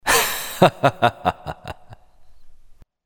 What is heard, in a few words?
laugh zh; Dota 2